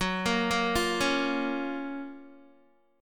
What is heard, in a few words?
Gb Major 7th Gb Guitar